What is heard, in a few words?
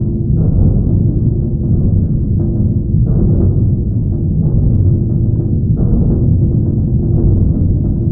MACHINE Factory Machine Heavy Slow; loop mono; MACHINES & MECHANICS; Universal Sound FX